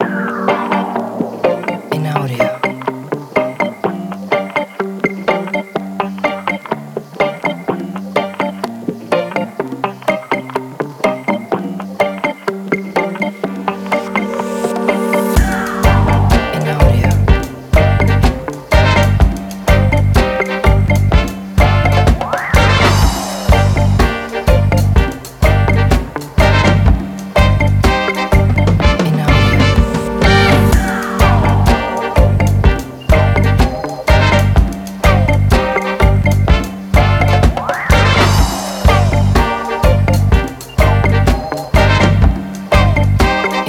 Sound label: Reggae Jam